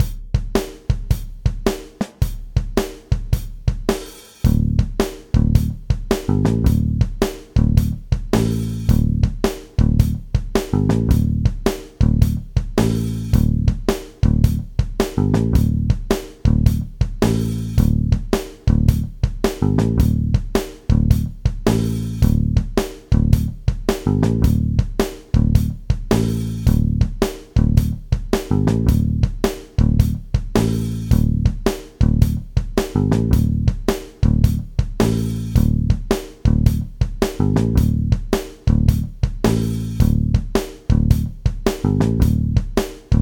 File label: backing track